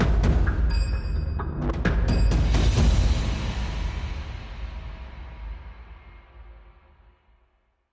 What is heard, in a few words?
drum trans; LoL; Game Music Events; crytal scar phase 1-2